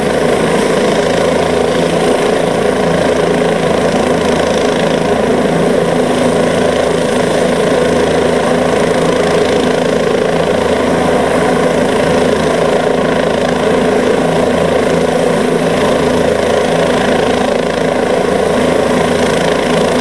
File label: Helicopter; Transport-AirMarine; PFx Brick